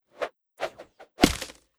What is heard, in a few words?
axe start